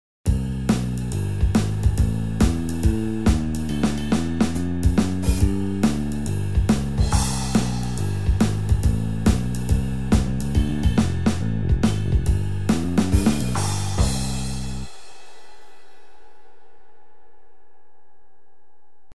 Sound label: jazz drum